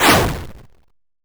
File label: Throw Molotov (Sound); Gloom